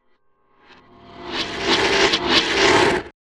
Metal Souls Vocalization Textures Reverse Delay Demon; Sound Spark LLC